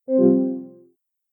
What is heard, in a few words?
dialog error critical; stereo; kde; ocean